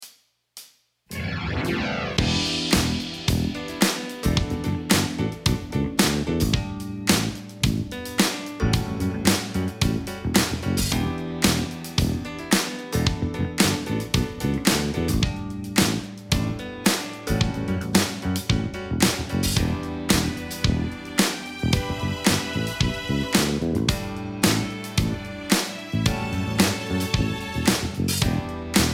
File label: Backing Track